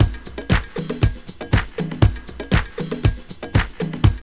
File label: DISCO LOOP; Zero-G; Funky Elements